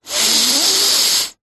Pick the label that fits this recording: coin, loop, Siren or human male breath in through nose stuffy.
human male breath in through nose stuffy